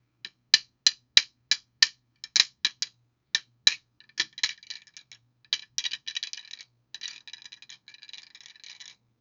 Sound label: foley artist making sound, sticks